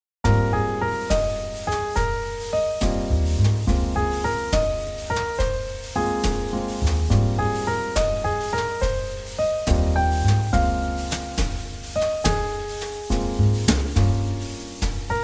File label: Jazz Ballad